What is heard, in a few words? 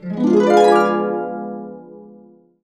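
D diminished 7, Harps; USB Soundscan vol.62; AKAI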